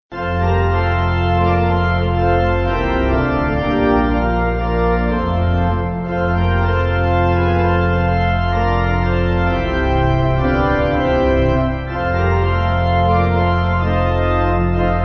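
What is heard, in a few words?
Pipe Organ, Music Backing Files for Churches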